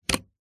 household electrical plug phone charger pull remove from wall socket